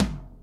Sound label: HOLLOW HT, SUPER FUNK; Pro-Rec - Synthsonic